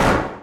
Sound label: flame loop; Undertale